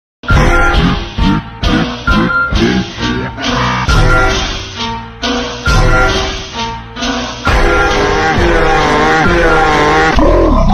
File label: phonk bass funk slowed